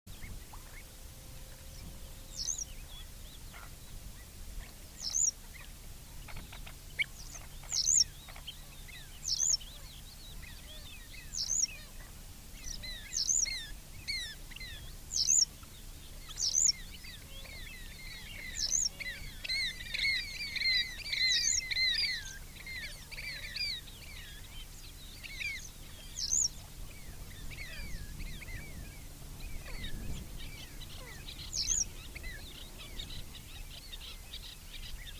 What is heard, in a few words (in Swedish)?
Gulärla